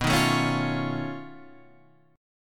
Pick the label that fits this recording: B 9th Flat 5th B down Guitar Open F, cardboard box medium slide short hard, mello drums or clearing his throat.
B 9th Flat 5th B down Guitar Open F